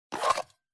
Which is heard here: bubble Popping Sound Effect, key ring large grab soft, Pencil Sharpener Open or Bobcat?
Pencil Sharpener Open